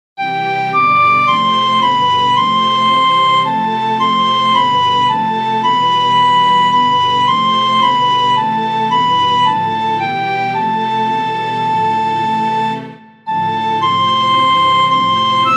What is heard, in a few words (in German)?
hoffnung keyb